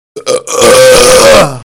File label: eaaagh